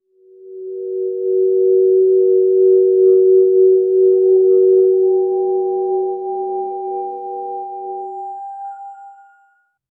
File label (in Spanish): un poder ansentral movien, ancient power